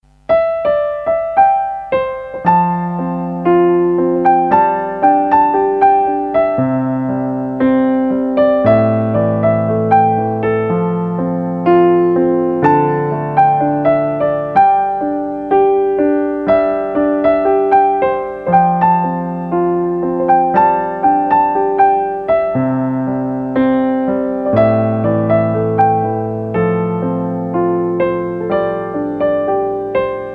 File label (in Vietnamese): Nhac Chuong Piano